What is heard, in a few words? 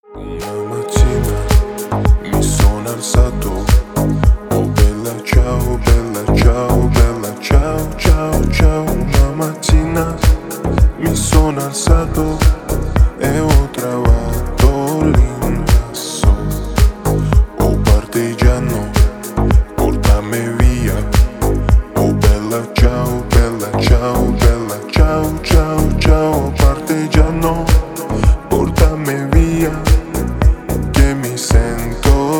call sound